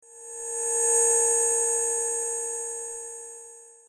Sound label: ufo warning